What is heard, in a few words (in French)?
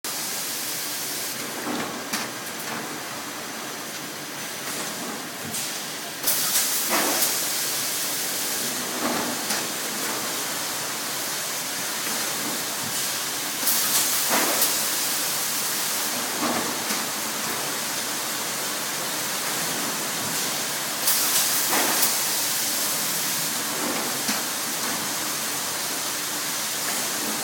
ensacheuse pellets VE 1000